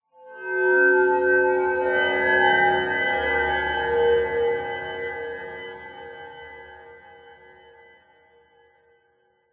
fantasy magic mysterious portal swell open with large reverb; zapsplat